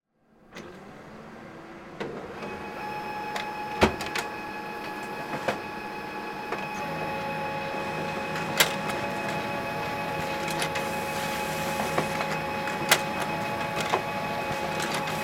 office printer hp lasterjet cp3525dn printing document duplex